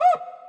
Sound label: cuica; twitch